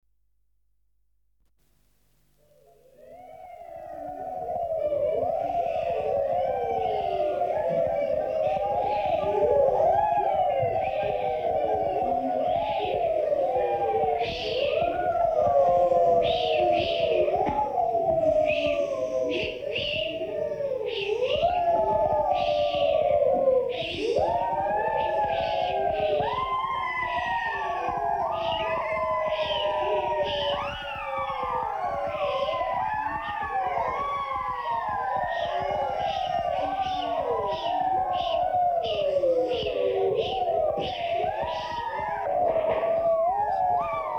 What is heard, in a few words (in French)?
tempetes